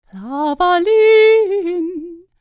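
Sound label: Scandinavian, World Voices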